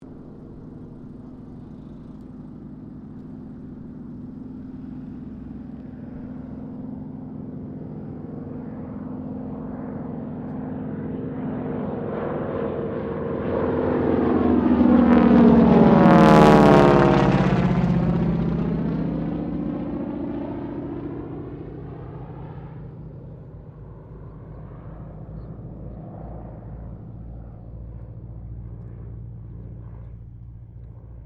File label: AIRCRAFT T-28D TROJAN PASS; Airplane; Airplanes; Vehicles